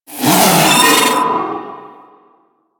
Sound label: Vocal Pain; Warframe; The New War; Archon; Nira